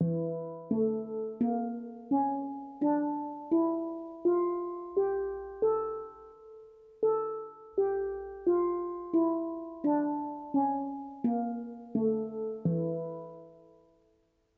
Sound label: E Hijaz